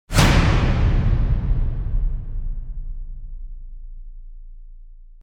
Zero Rise Whoosh; Gothic Storm Music

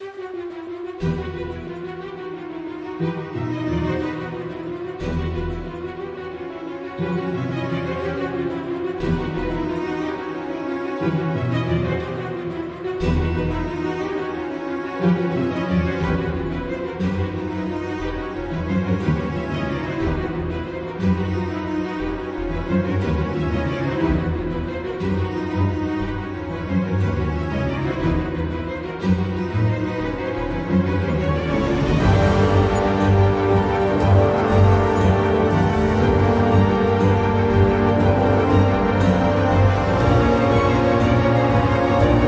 Hysterical Ambient

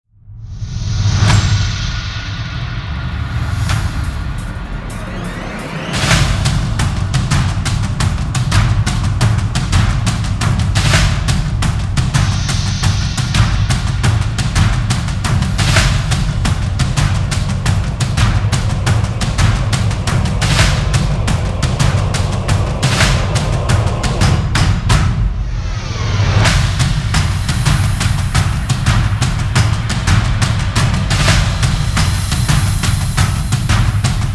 Drums Only Version

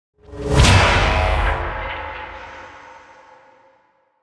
Disturbed Slam, Sound Design Trailer; Gothic Storm Music; Brain Warp (Sound Design Trailer Tools) 2011

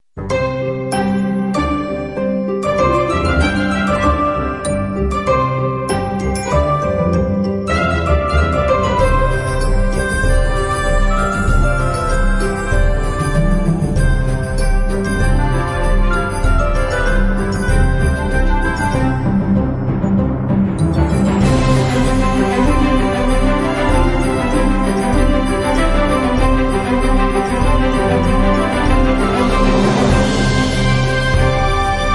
Winter Cheer

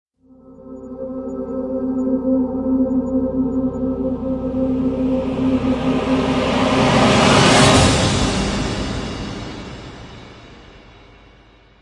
Rise

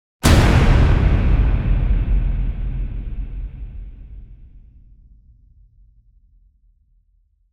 Big Ballz Hit Epic; Ninja Tracks

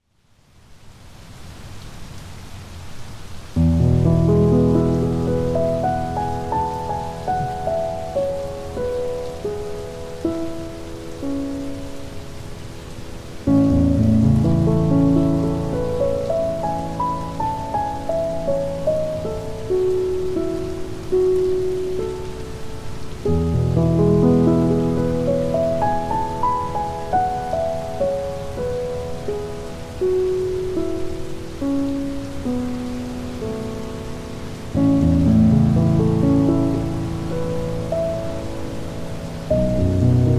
Piano & Rain

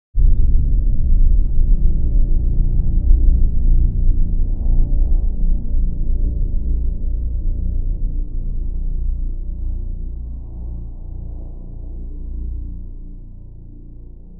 Boom Drone; Epic Tools vol. 1

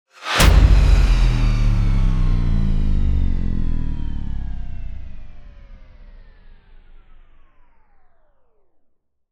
Cutback Hit Downer; Ninja Tracks

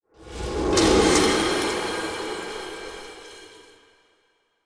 Sting Flushed; Brand X Music; Brand X Music Catalogue - Editor's Essentials

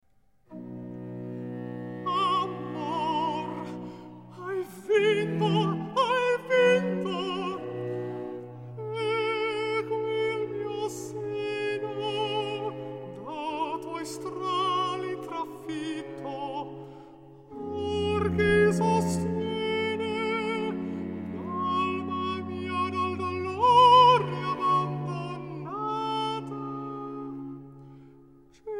Cantata for Alto solo, Recitativo; Magnificat; Amor hai vinto

